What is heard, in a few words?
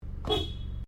CAR SUBARU LIBERTY EXTERIOR IDLE HORN SHORT; Subaru Liberty 20 01; Family members Subaru; Vehicles